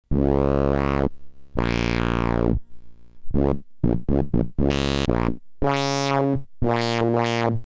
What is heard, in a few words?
bass cut pad